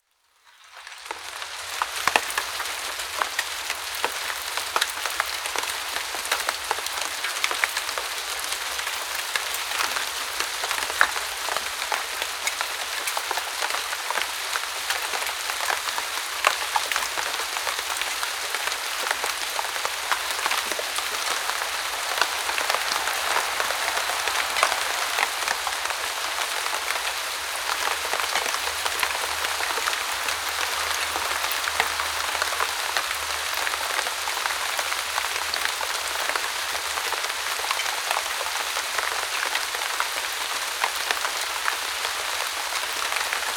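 sound of rain in a deciduous forest, nature sounds; zvuk dozhdya v listvennom lesu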